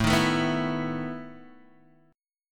E Major 7th Suspended 4th A

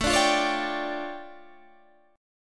D Minor Major 11th, A

